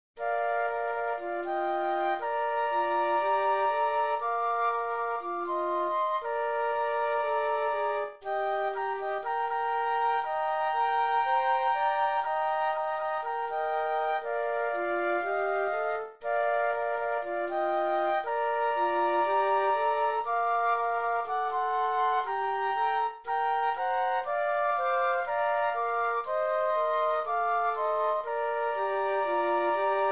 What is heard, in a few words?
Flute Trio